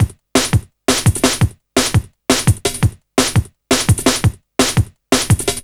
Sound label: Jazzy Break, Jungle; Breaks; Blu Mar Ten - Jungle Jungle - 1989 to 1999 Samplepack